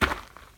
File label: gravel step; Golden Crayon Sounds